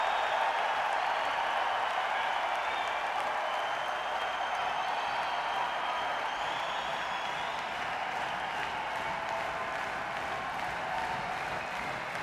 Crowd; Mansfield, MA; Great Woods Amphitheater

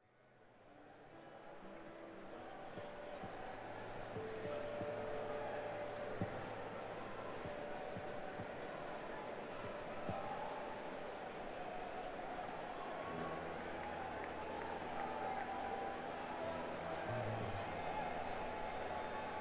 Crowd; Las Vegas, NV - MGM Grand Garden Arena

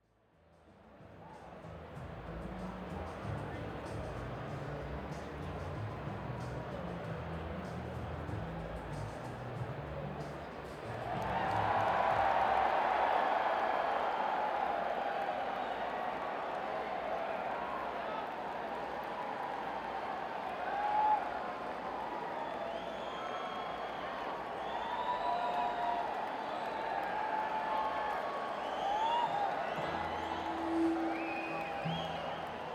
Crowd; Canandaigua, NY; CMAC Performing Arts Center

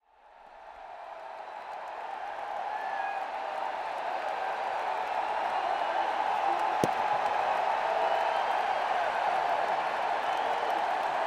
Crowd, Coliseum; Hampton, VA

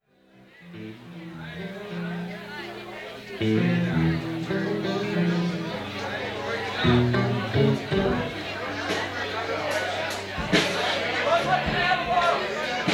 Tuning; Burlington, VT; Nectars

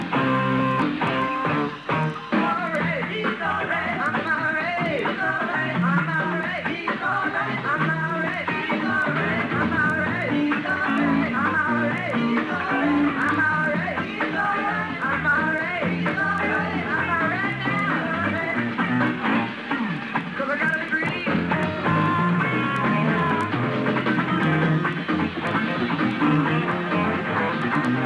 blues; Burlington, VT; Hunts

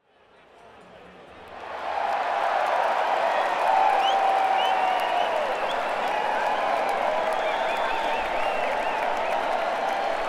Crowd, Coliseum; Hampton, VA - Hampton Coliseum

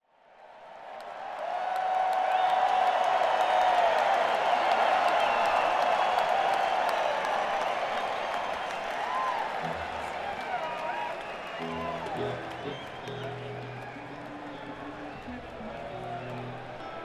Crowd; Las Vegas, NV - MGM Grand Garden Arena

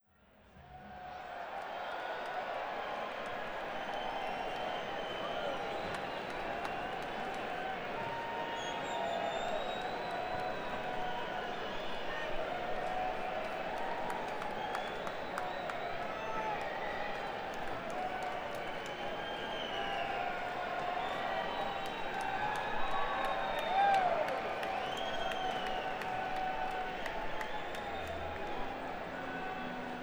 Crowd; Phoenix, AZ - Ak-Chin Pavilion

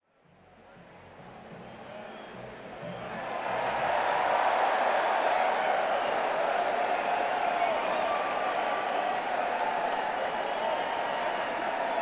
Crowd, Madison Square Garden; New York, NY

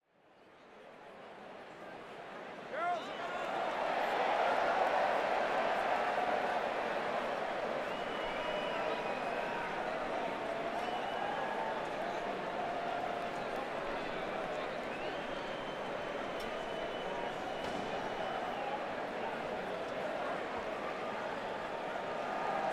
Crowd, Madison Square Garden; New York, NY

